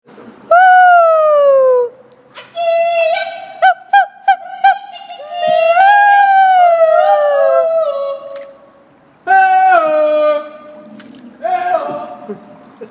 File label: Psychedelic